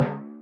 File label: cloud bounce; Teen Titans Go! - Raven's Rainbow Dreams